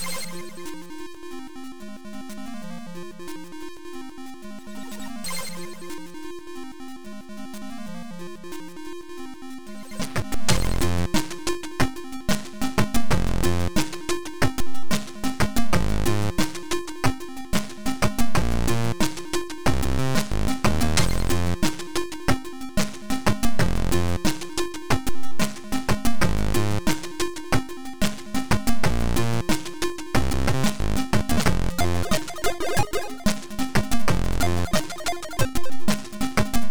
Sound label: grooves; ejkot